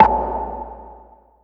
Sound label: synth soda pop A